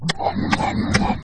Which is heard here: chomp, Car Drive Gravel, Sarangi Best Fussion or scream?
chomp